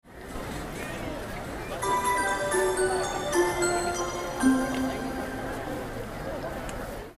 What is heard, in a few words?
chime; 旧市民球場のチャイム; ０９年３月２２日広島市民球場(オープン戦)